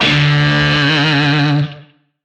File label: Shawzin D; Warframe; Lizzie Shawzin